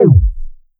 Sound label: Hi Tech Drum Kick Synth